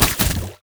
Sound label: minefield lan; LoL; ziggs poolparty